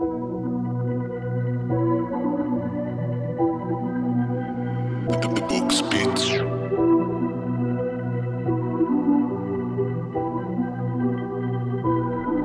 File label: scratch beat; scratchpad; patent sounds